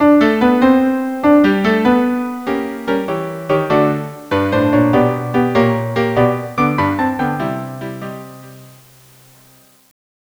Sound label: march trio